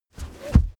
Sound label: car armrest backseat close fast hard